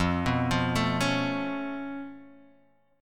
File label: Db Augmented 7th F